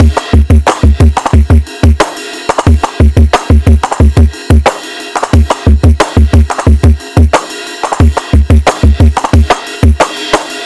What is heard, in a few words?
Ride